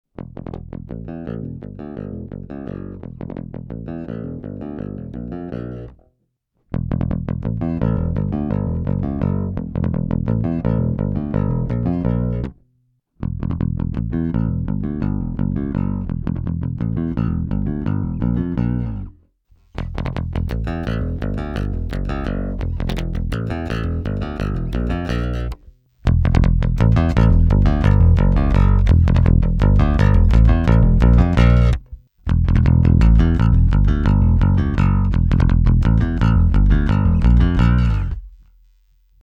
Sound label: Pick muted div EQs